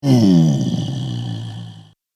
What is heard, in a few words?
Ogre Growl; horror; Monster Sound Effects